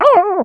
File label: death; quake2